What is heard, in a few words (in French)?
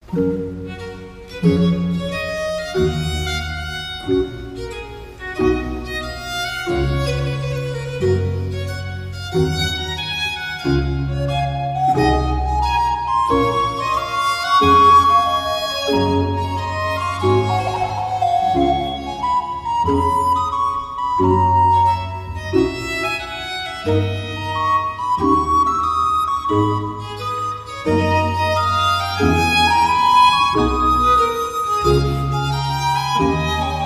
sonate flute et cordes; BACH; cantate bwv 182